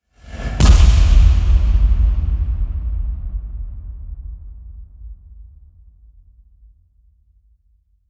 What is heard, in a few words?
Impacts Demolition; Gothic Storm Music; Advanced Sound Design 2012